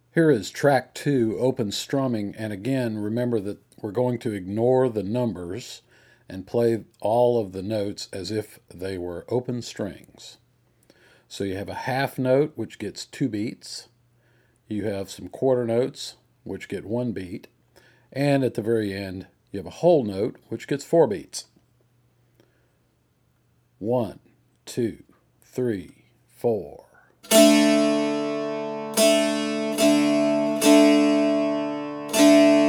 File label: open strumming, dulcimer